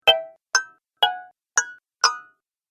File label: App Menu - Five Sounds, Ping; Multimedia